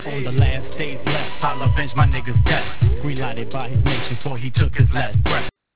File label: cuban